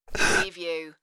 Man Gasp

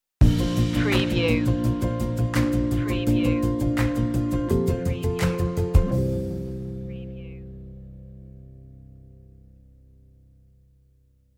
you know it stinger

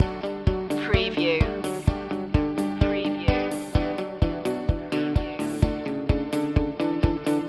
corporate ventures loop

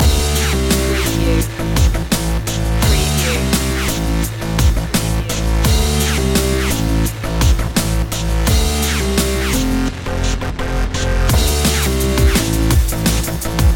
stomp loop

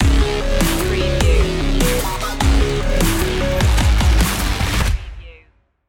hybrid human stinger

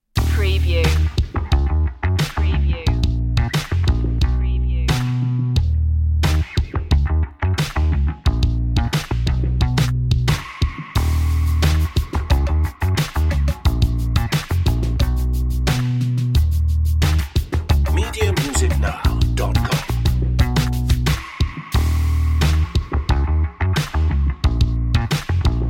night ride bass drums

